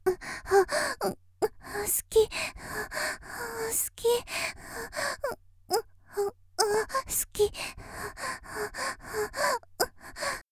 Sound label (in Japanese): 「喘ぎ」（好き）と言いながら; 綾波系; 少女